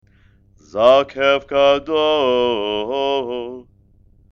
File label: haftarah, trope